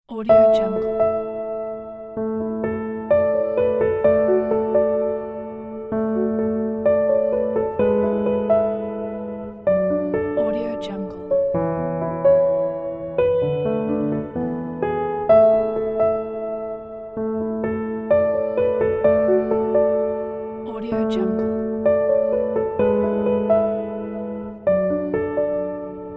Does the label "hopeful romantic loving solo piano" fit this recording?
Yes